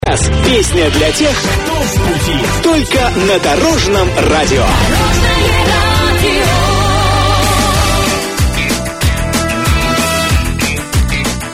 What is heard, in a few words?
jingle; russia